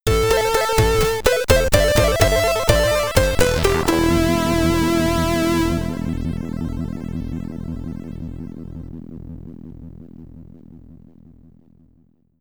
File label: Game Over! (SID Stereo); Tibo's Tale; commodore 64